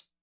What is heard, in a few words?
disco hihat